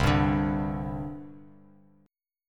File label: C Suspended 2nd C Bass Standard; strummed; x,3,5,7; JGuitar